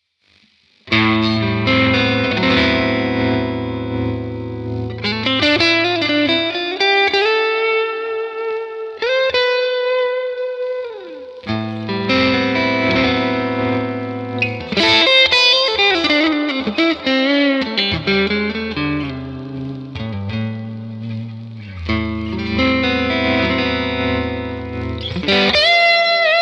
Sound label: iAxe 624 over AC Box Combo; Liquid Glass